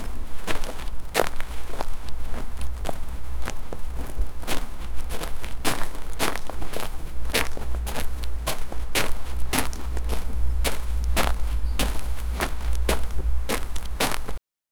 footsteps in the sand, beach

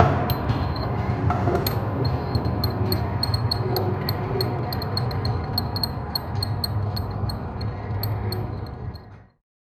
create a dynamic ambient, construction

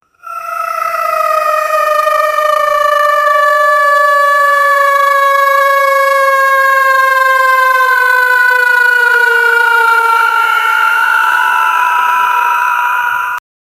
sound of dying goblin

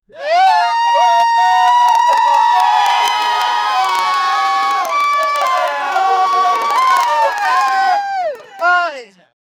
pirates cheering strongly

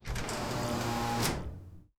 building

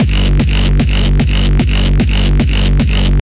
groovy hardstyle kick, club

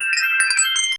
a tiny icy tink followed

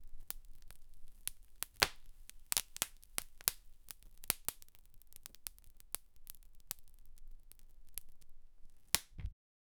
a pancake being flipped

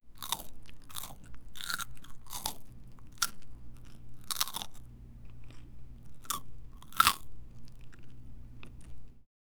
chewing chilly

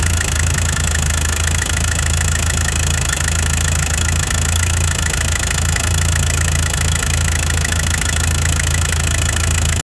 a v10 engine running on, redline

